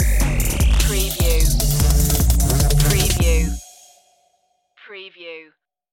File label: kick my bass stinger